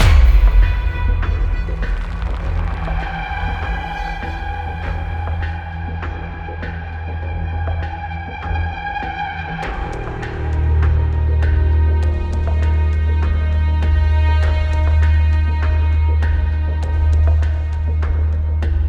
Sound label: nervous loop